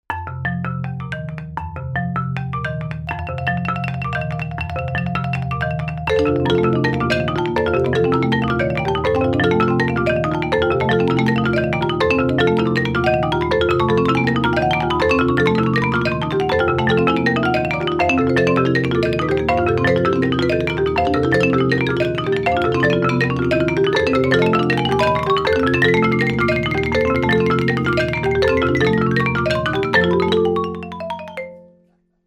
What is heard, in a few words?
MARIMBAS